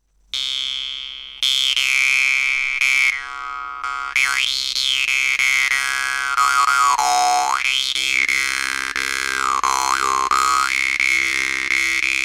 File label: melody jews harp herdsman